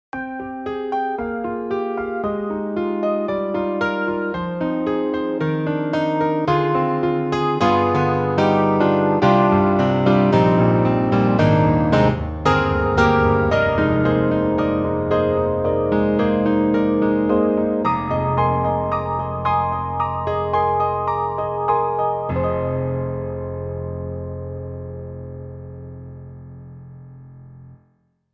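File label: Roland Juno-Gi Background Piano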